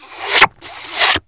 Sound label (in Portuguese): lingua; 7invasores